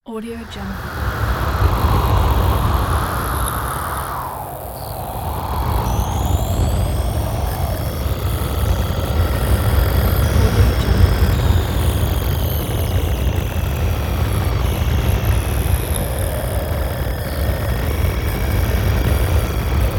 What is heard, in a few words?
magnetic storming